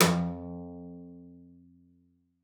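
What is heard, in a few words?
CELLO spiccato F2; 90 s Sample CDs; Xsample VOL-5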